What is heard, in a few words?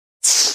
Air Release Shrt His